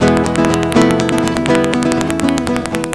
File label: Nylon, Cool Licks; Sound Factory